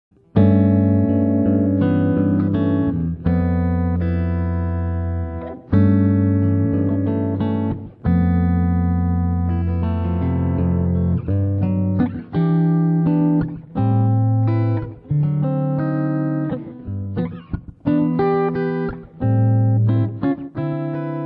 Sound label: Dualtone Jazzy, guitar